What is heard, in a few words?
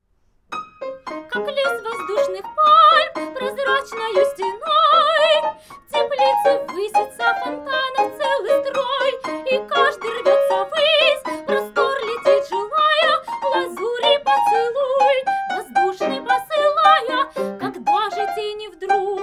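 domra, Neumann TLM170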